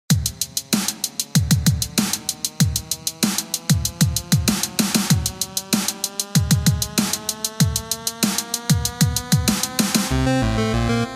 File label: Music Underground; underground lvl